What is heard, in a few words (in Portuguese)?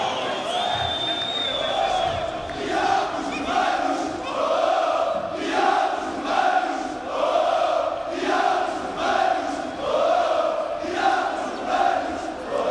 Diabos oh oh oh, Chants